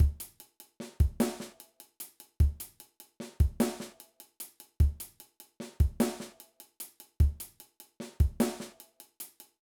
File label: Shuffle Groove, drum